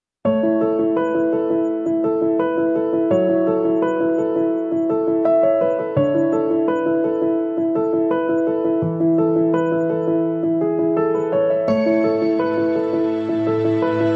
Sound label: Romantic